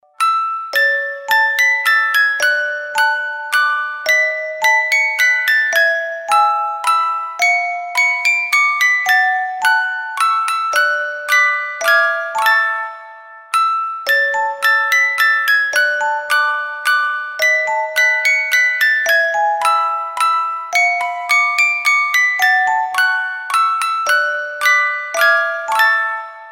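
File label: We Wish You a Merry Christmas music box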